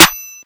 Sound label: SNARE